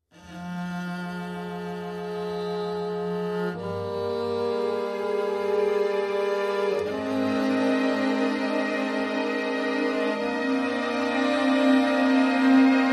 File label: Strings Only Mix